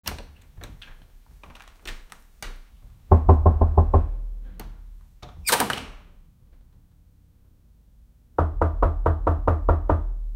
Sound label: knock on the door open